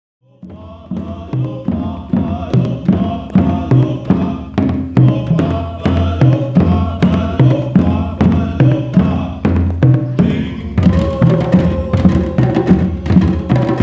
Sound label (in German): Trommeln